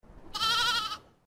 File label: sheep; grade3; english; simple messages